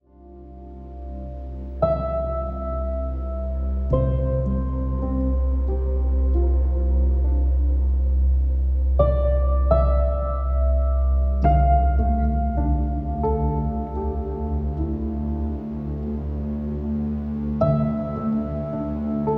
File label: Aurora Trails, ambient cinematic; ambient cinematic vol1